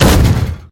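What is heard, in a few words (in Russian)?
тяжелый металлический грохот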